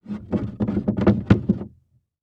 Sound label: Foley Footstep High Heels Stumble On Wood Platform; Foley Footsteps